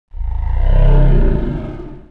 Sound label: alert; slender; batman visional